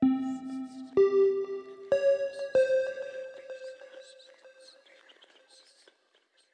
Small Arp; Solos

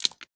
rock falling

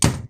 slam

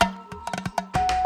Little Break, drums; BB 2HTC Samples Vol 4

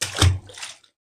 splash; water splash slime sfx

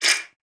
alien, creature

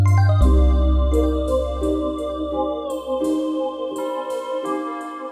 Bloop Lead; Solos; BB 2HTC Samples Vol 4 Addendum

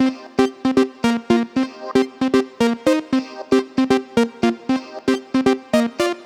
Fun Blips; Solos

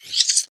attack, creature

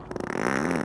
Farts; Weird

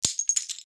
glass breaking; breaking falling hit sfx

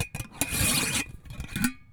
Metal Lid; BB Retail Therapy Sample Pack

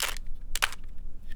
Small Cube Twist, Rubik's Cube

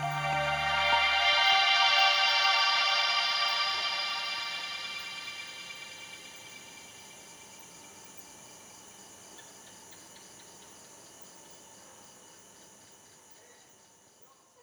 Fadeout, Pads

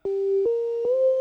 Lil Sine; Solos

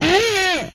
Hit, Vocal; retro arcade

